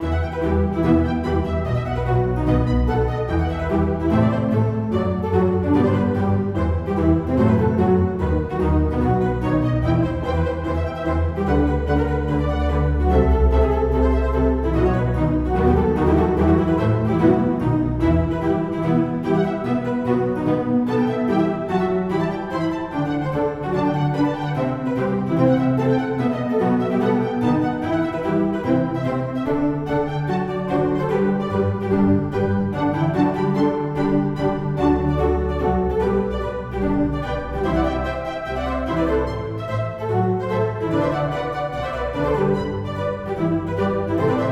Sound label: Flute Concerto in G Major Allegro; Arr. For Mixed Ensemble